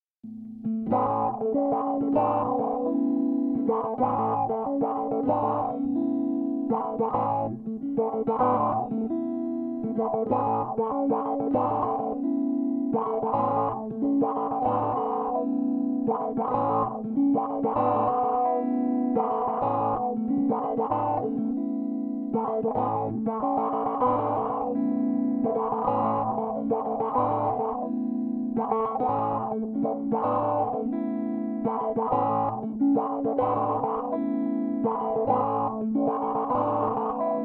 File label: A96 GTR motif in 5/4